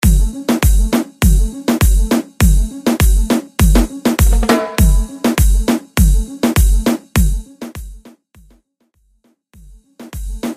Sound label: Reggeatton Mix; Mainstream VIP; 101 Bpm